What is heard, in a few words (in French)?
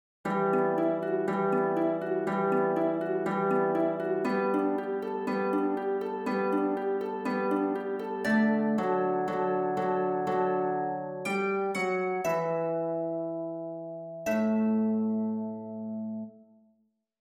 HARPE